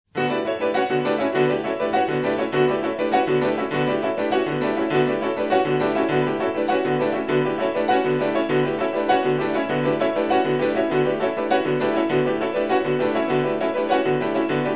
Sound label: Six Pianos; rosas